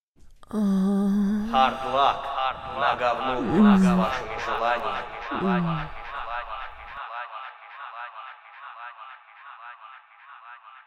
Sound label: jingle; Fallout 2014